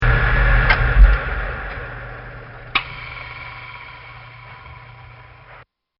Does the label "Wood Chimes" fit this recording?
No